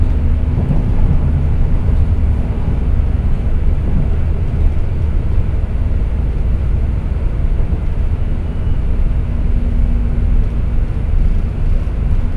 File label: Inside Train, Binaural, Background Noise; TS 103 224 Background Noise Database